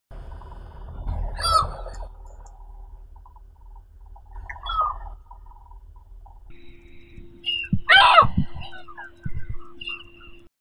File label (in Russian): chaika chernohvostaya larus crassirostris